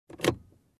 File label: Opening compartment in car